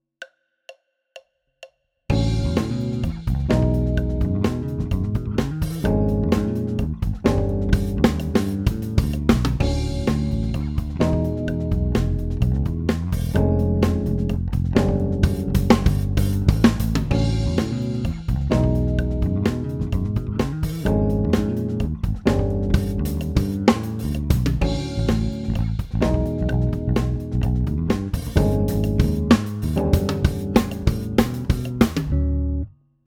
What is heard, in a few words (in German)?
Modulation Vorstufe